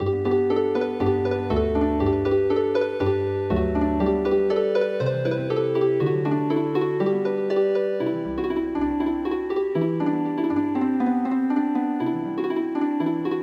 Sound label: Healing Harp, midi traditional; Yankee Doodle